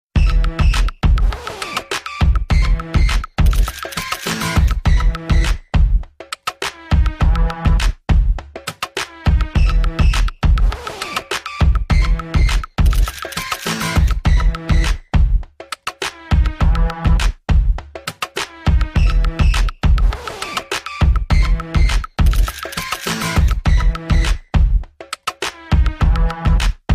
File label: black beat, hip hop